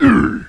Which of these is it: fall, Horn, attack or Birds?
fall